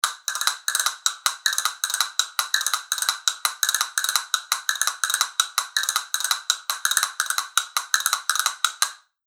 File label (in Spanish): castañuelas fibra